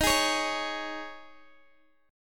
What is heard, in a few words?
Eb 7th Suspended 4th Eb, Guitar Open G; down